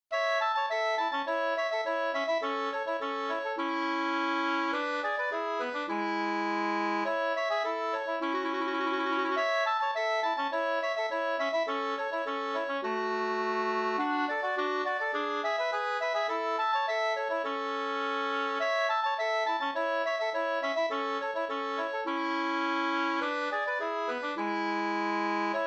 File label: polka; Vartoner Talgoxen av Josef Krysander 2st